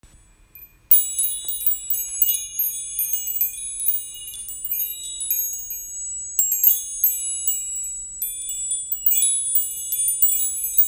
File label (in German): Klangspiel Rosenquarz